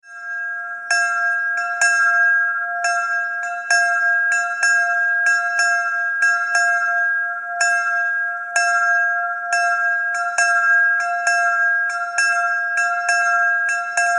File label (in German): Glocke Kaepelle; Altsteusslingen